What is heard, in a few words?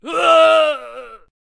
Chieftain Death Vocals